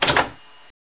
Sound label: statue switch; statue puzzle